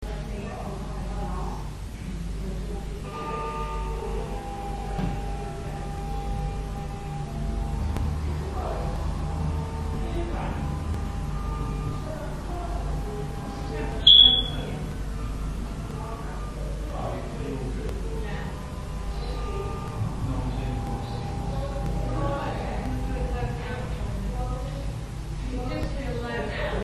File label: organ music hallway